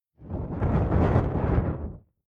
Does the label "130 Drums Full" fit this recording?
No